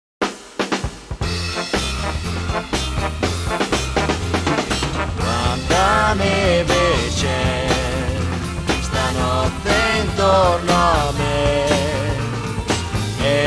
Jingle Bells

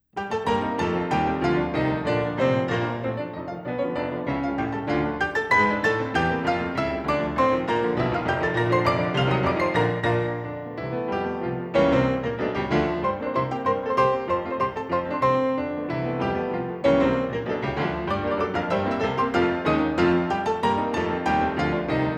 for piano 4 hands; Erik Satie; La Belle Excentrique (Fantaisie Serieuse); Cancan Grand Mondain

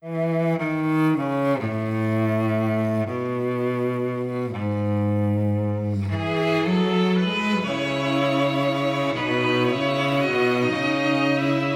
instrumental strings only version

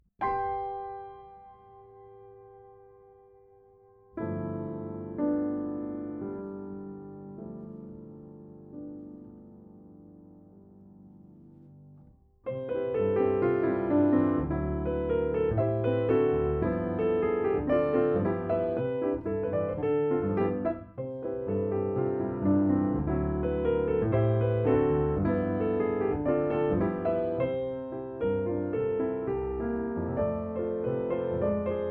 piano

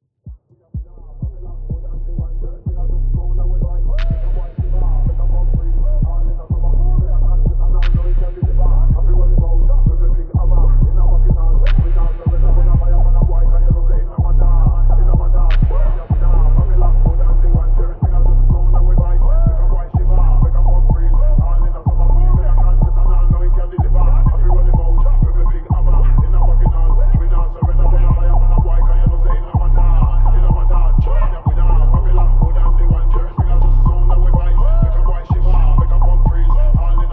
Focus Soundscape; Endel

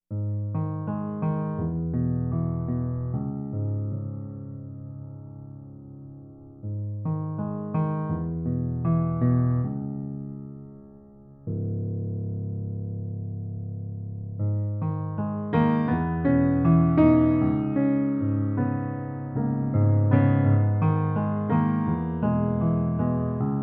(Piano); Westworld Season 2; HBO

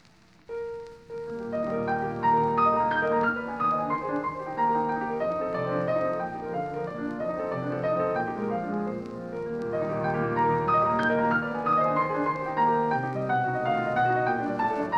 Prelude in E-flat major; Frédéric Chopin

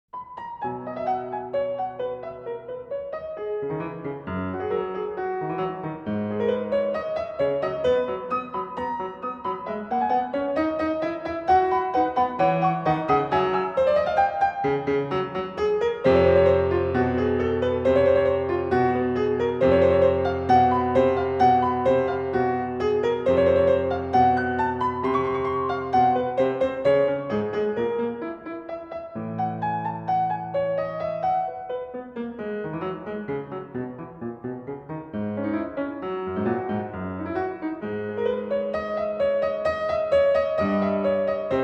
Prelude in G sharp minor, Piano

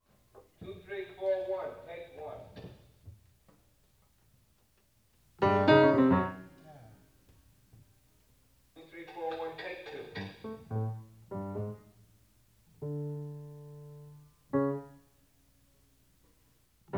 (false starts)

